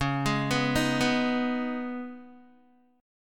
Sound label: Db 7th Suspended 2nd, Guitar; 8,11,11,8,11,x; Guitar-4F,4A,5C,5G,5C,6E; Db7sus2 arpeggiated